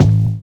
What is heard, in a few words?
BUZZ SNAP; Univers Sons - Kitch 70s